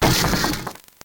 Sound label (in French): Cri